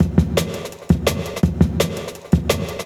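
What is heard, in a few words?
Jungle Breaks, 168 bpm; Original Jungle Breaks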